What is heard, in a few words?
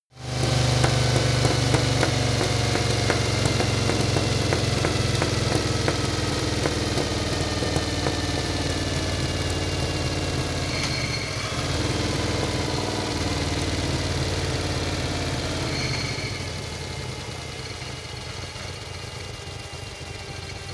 motorcycle harley engine decelerate rattle